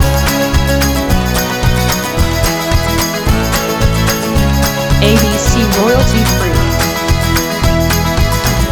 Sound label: Celtic Folk loop